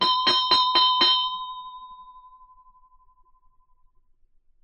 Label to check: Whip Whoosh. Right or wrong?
Wrong